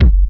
good hip hop kickdrum sample g key

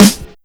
dirty snare drum sample g key

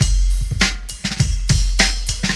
134 bpm drum loop f sharp key

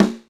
studio acoustic snare sound a sharp key; snare drum samples

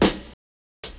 large room steel snare drum sample D# key

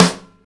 treble heavy snare single hit f key; snare-drum-samples

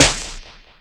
noisy snare C sharp key; Mono

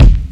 good kickdrum sample f key; tuned drums f key

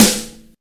subtle reverb snare drum sound a key; snare-drum-samples

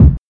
puffy kick drum sound c sharp key; kick drum samples

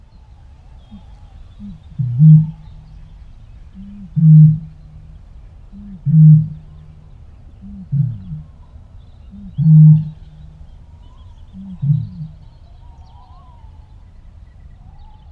bittern in wetland; soundscapes

